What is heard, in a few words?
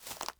foliage step